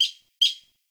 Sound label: Forest Open Glade Chipmunk, Day; Ambience